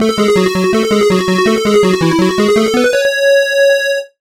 Sound Test

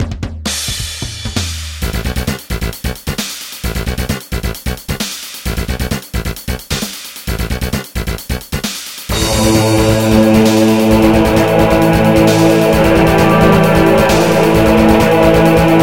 Bass

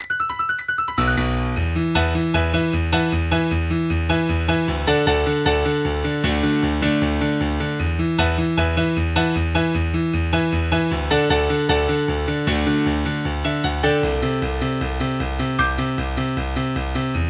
piano; Dragon ball z

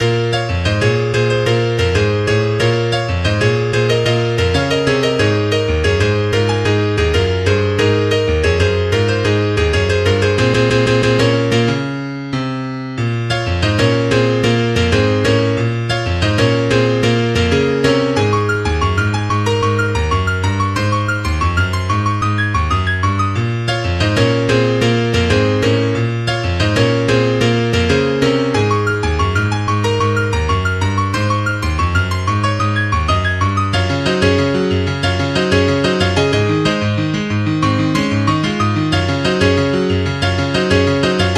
Game Boy

